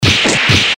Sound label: eastern fist hit seq